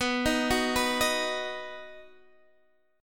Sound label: D sharp Minor Sharp 5th B, chord